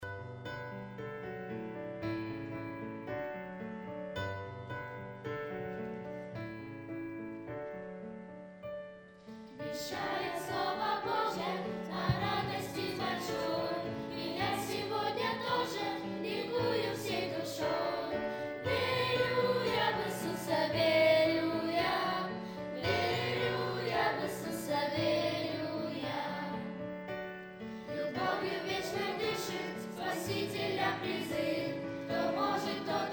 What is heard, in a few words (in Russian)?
Детский хор, Вещает Слово Божие